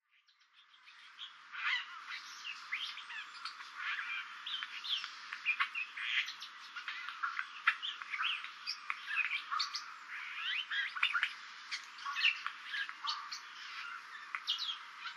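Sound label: kakesu, Eurasian Jay; utsunomiya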